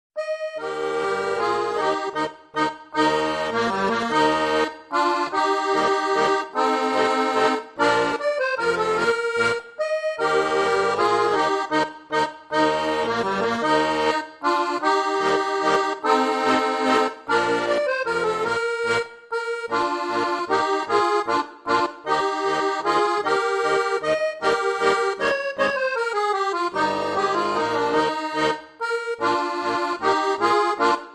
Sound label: haydn es major menuet; classic